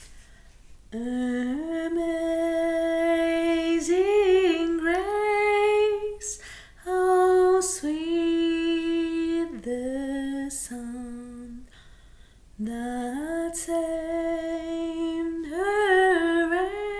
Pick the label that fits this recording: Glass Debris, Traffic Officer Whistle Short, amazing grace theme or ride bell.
amazing grace theme